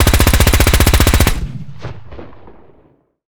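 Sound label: Weapon Rifle Laser Gatling Fire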